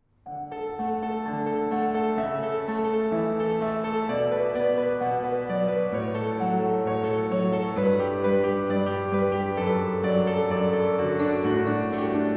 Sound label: Hymn